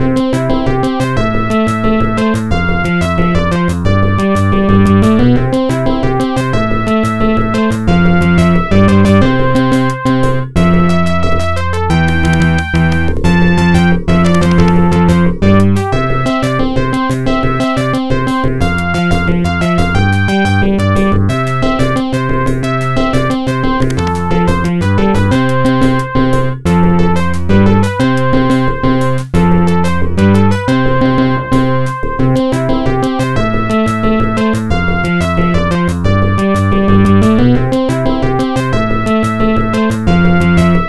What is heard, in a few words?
arcade credit roll; golden axe